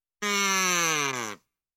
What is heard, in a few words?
Deep Party Blower Sound